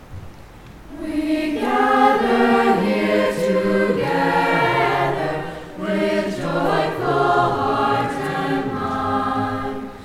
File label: Chorus